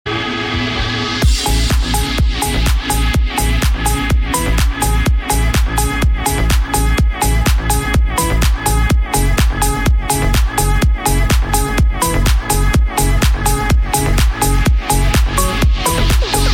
Trance Classic

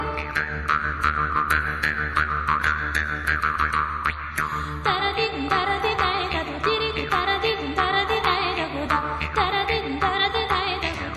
Bulgarian Music

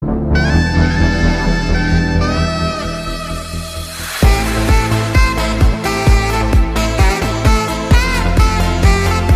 Saxo; fortnite

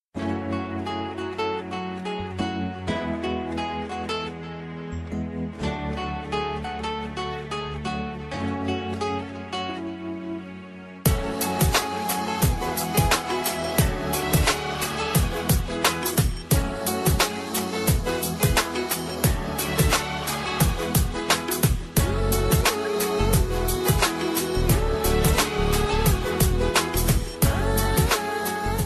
Soothing Tone

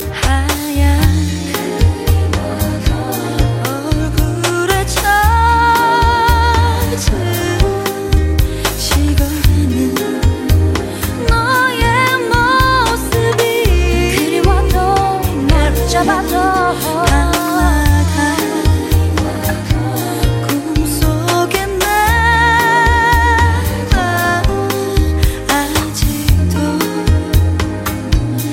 Bossa Nova

